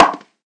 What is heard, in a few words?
Fracture Rim; ModeAudio Found Percussion Hits